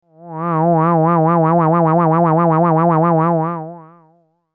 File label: "Yah yah"